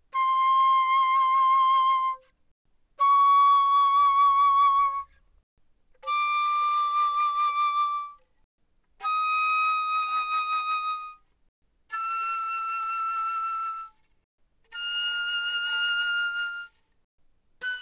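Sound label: Flute vibrato mf C6 B6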